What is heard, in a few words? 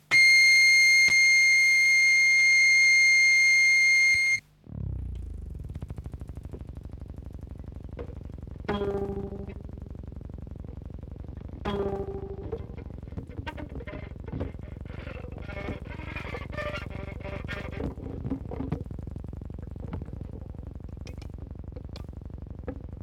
Howling Violin Music